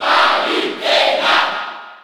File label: Cheer French; Palutena; SSB4